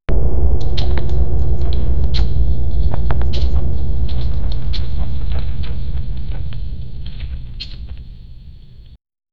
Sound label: candle flickers book page, library